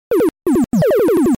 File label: magical 8bit plug tom